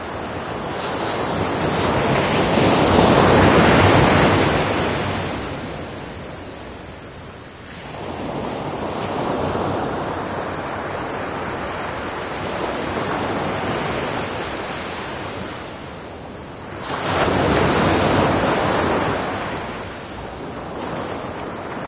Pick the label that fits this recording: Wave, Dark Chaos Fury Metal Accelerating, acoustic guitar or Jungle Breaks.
Wave